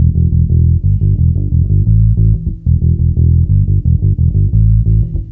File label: Bass Loop, Ambient Grooves